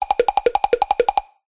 cartoon run scramble drum percussive

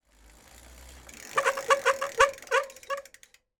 transport bicycle brake squeak

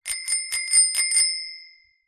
transport bicycle bell ring

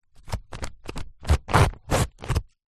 paper sheet cut with serrated knife; zapsplat

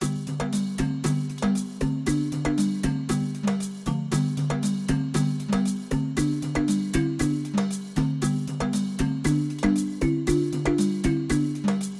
African fun long

